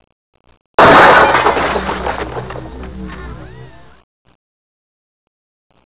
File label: GLASS; Talking E-Mail